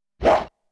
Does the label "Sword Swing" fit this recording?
Yes